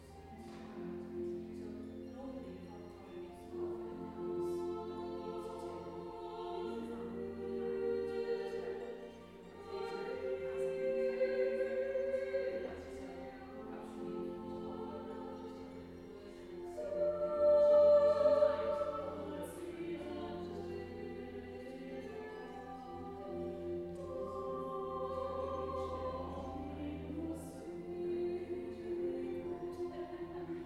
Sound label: ave maria duet; gounod